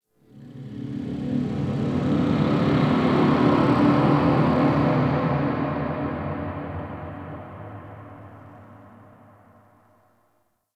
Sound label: Golden Maw Ambient Texture; Warframe; The War Within